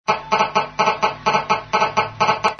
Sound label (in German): mobiltelefon